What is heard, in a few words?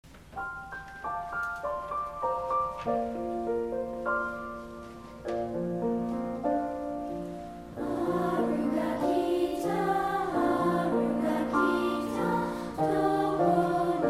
Haru Ga Kita, Japanese folk song; arr Gilpin